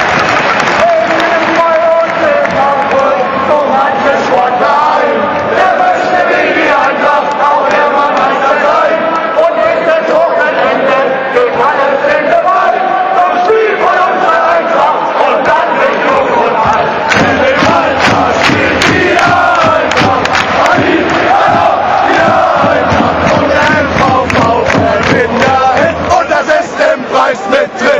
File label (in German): Waldlied, gesang